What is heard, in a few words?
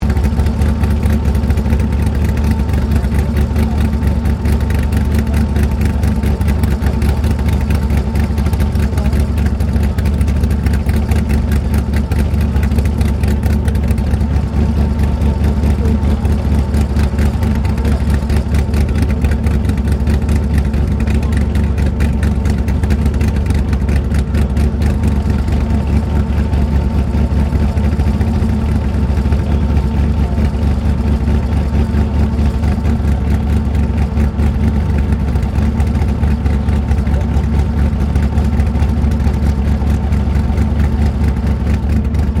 ENGINE CHEVY C5R 7LTR IDLE SIDE CLOSE, Army recruiting vehicle; Trucks